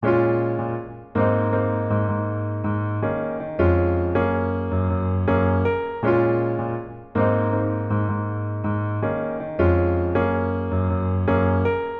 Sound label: Piano Loop 160 BPM A# Min; Piano Loops; Future Bass; Instruments; Scarlet